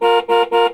repeat car honk; repeated car honk

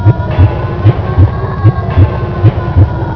OVNI, industrial engine

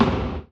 distant hit

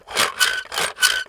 gear squeak, wheel

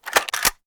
rifle reload

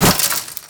glass panel crash

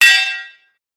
anvil hit; smithy

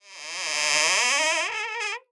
cupboard door squeak

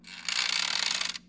spring bed cracking, coil

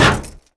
light collision, light metal board collision

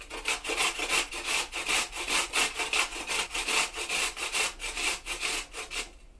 grate

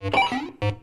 arcade beep

